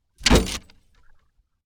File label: crash